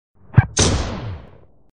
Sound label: bullet time gun fire